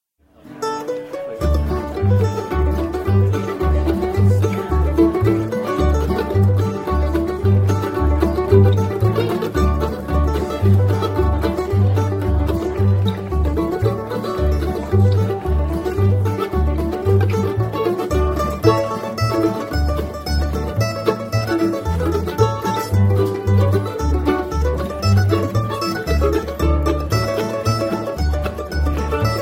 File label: Mandolins; Fishers Hornpipe